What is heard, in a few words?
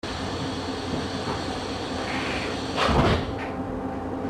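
Door Close, Train; RBDe560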